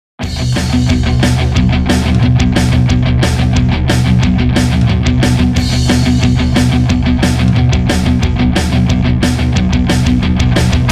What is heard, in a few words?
Holidays 180bpm remix